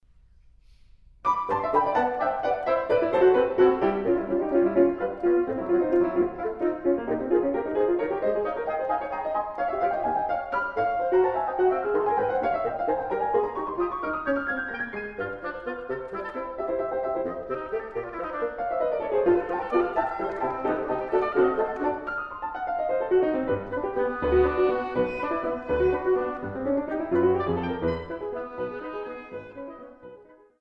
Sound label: Trio; Francaix; Resolute